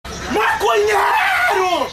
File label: funk